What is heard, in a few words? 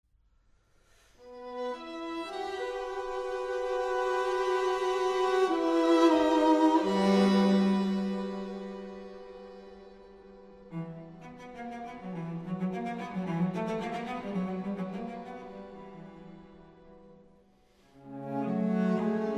String Quartet; I. Adagio. Con moto